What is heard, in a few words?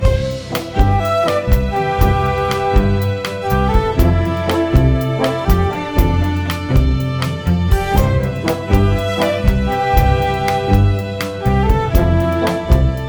winning Loop